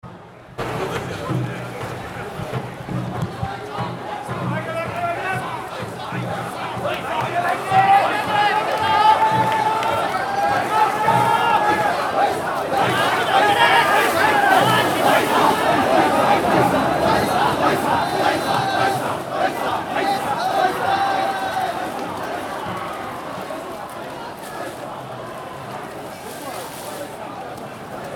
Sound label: hakata festival